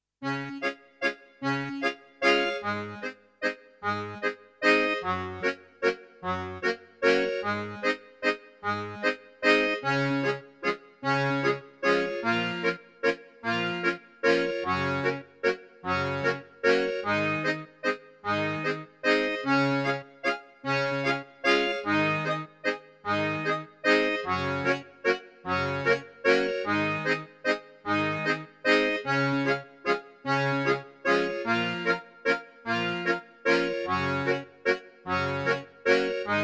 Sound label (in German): akkordeon